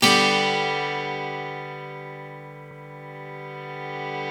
Dual Ac Chd; Club-50 - Foundations Roland

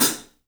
3D Drums, TIGHT ZILDJ; 3D Sound

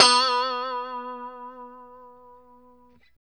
POP B3, STRAT guitar

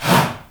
HA, SHOUT, Heart of Africa; Spectrasonics; Heart of Africa 1; CH. SHOUT1

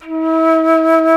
FLT E2, EBONY FLUTE; InVision Interactive; Lightware VOL-1; Instruments & Percussions

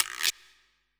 GUIRO; JV-1080 DRMS; EdgeSounds; Drum Mashines VOL-1

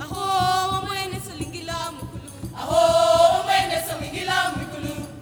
AHO D6; Spectrasonics; Heart of Africa 2

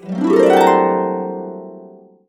C, Harps; Complete Harps; USB Soundscan vol.62; AKAI

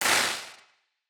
Wider Clap; stereo toolkit

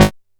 G#2, Bass; E-MU Producer Series Vol. 6 – Analogia Project; Boom da Bass

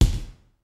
kicks snare, kid; Kid Nepro; Elite; KICKS SNARES

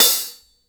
Hi Hats; InVision Interactive; Lightware VOL-1

